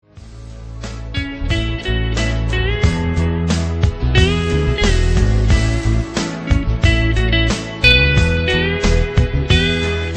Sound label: instrumental guitar cover